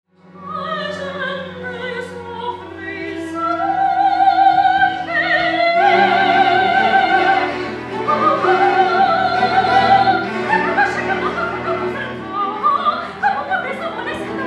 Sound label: Cello Orchestra; Bachianas Brasileiras No. 5; Villa-Lobos